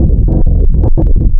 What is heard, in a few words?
piano; Adventure Kid